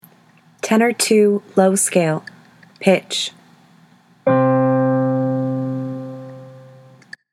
tenor ii scales low; all-state choir

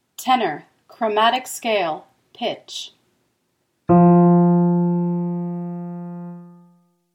tenor chromatic, choir; all-state choir

